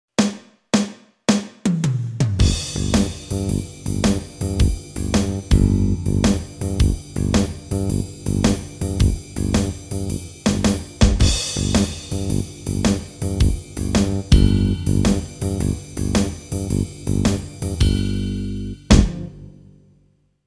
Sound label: Blues